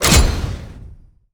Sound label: ui store open; LoL; hud